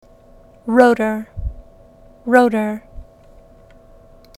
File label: rotor